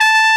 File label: Hard Trumpet A4; Proteus 2000; Digital Sound Factory Proteus 2000